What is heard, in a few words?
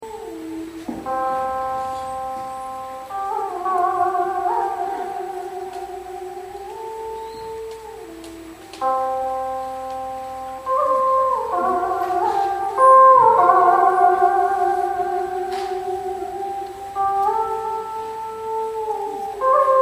Hanoi H2O Puppets